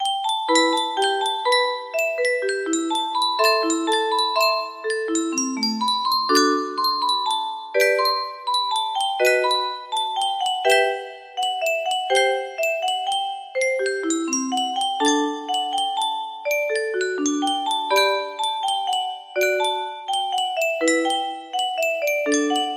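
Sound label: arabic song, music box melody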